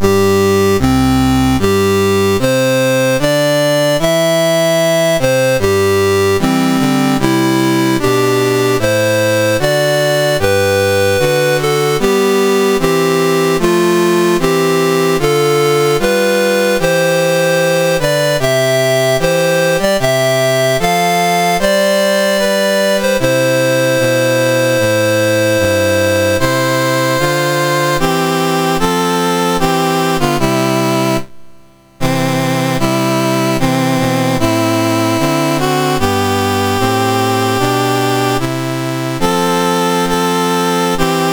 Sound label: MOS6581R2, National Anthems; Saint Vincent and the Grenadines